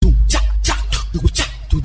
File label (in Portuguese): beat vem pesadao, Base De Funk